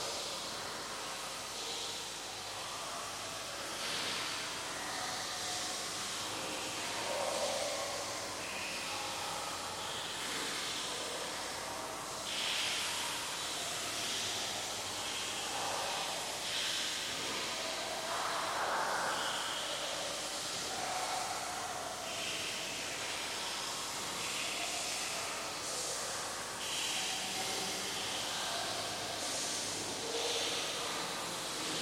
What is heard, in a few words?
night, cavern; ambient soundscapes; dota